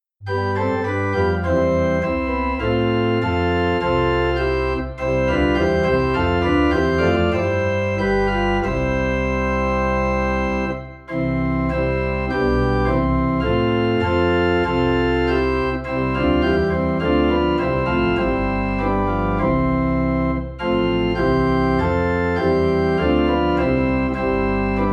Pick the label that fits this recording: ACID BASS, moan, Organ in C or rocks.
Organ in C